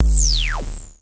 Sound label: Klone Dual Percussion Synthesiser